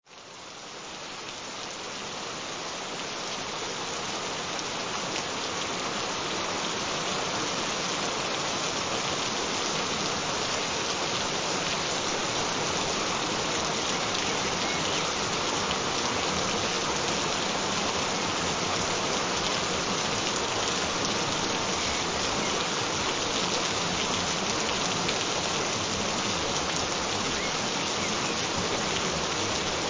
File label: Gentle Rain Sounds; Get a Slender Tiny Waist; Perfect Skin